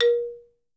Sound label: MH A#3, Percussion & Harp; Miroslav Vitous